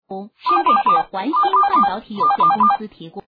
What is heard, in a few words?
Keypad tone